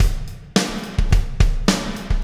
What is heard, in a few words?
Beat, Processed Drumkits